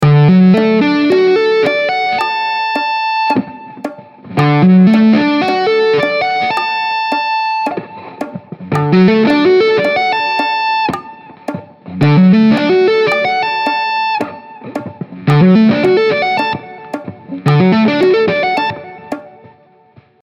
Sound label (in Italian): Posizione Fondamentale Ascendente